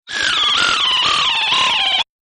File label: psycho fall, Laughter; Voice; Partners In Rhyme